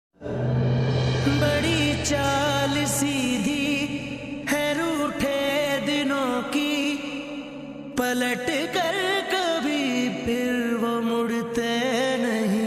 (slowed) pakistani ringtone

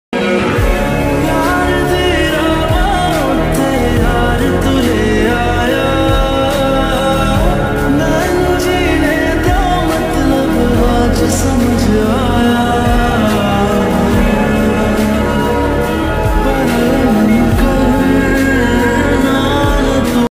slowed reverb hindi ringtone

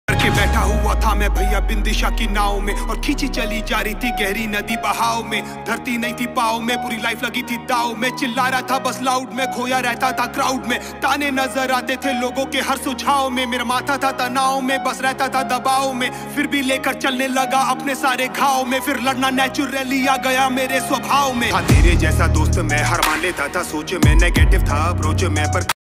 hindi rap ringtone

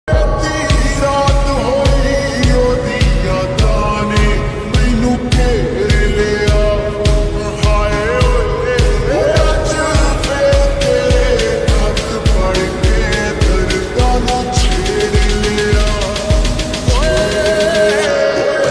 slowed reverb punjabi ringtone

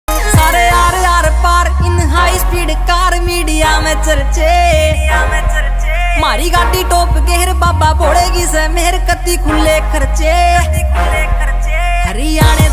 haryanvi ringtone

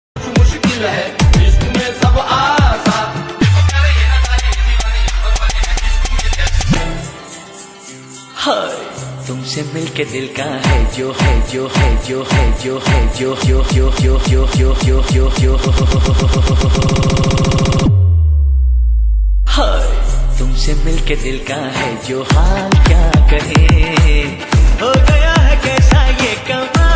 hindi ringtone

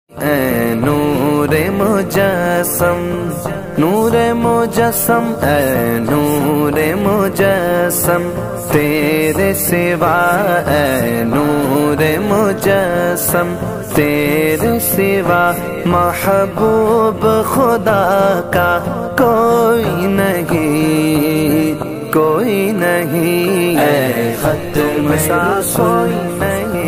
islamic ringtone

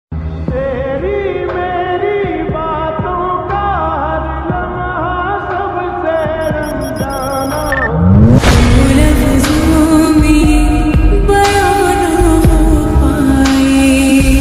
lofi remix hindi ringtone

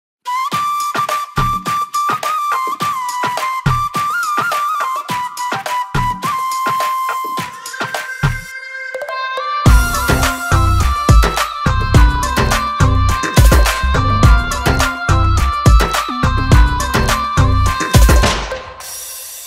haryanvi BGM ringtone

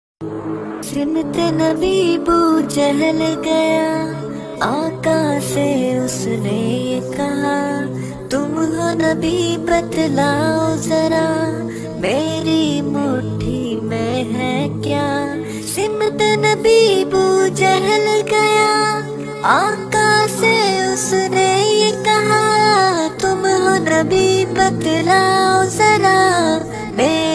islamic ringtone

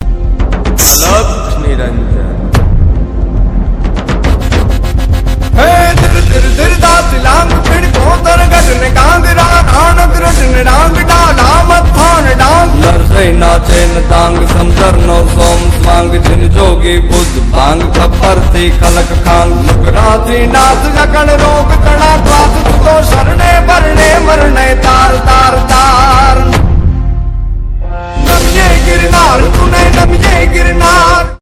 gujarati ringtone

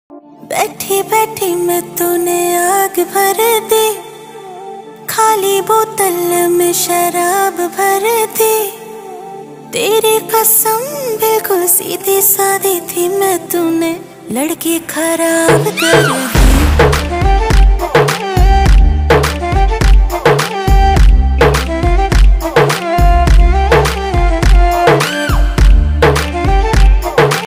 hindi rap ringtone

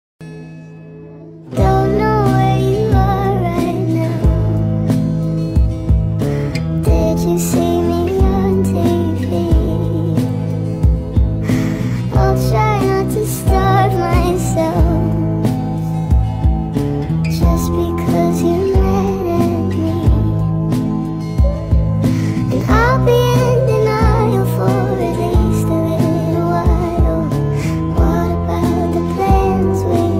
speedup english ringtone

